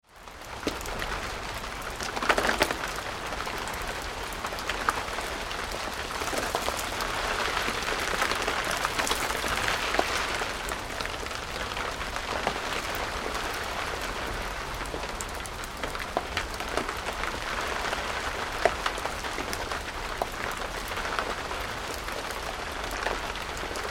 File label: Rain onto Tent Canvas, Medium rain with drips; Weather